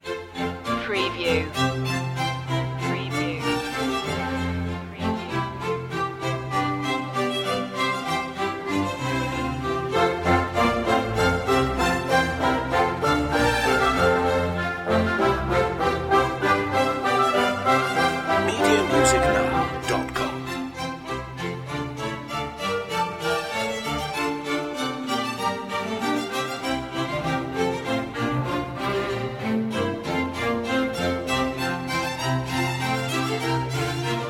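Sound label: bouree water music suite no1 f major, oboe; handel; hwv348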